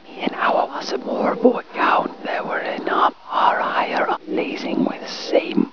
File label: male chant reverse